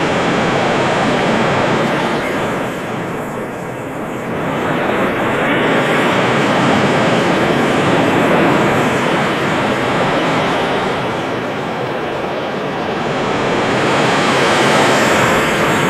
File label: cinematic sounds of a, audience